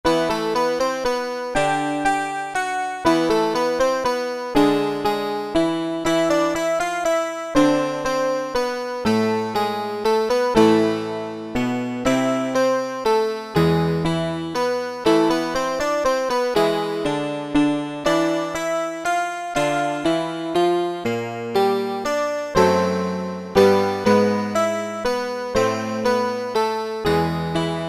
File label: menuet